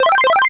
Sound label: Platformer Powerup Pickup